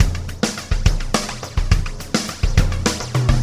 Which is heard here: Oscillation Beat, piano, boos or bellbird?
Oscillation Beat